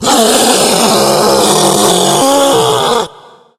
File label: hulk yell, tank voice yell; Left 4 Dead; Source Engine